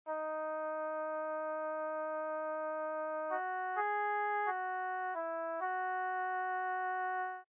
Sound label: Hallway Flute; gamerip; Papa Louie When Pizzas Attack